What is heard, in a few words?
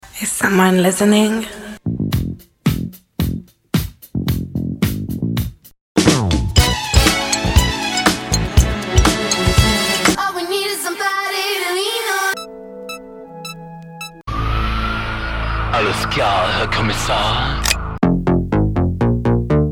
POP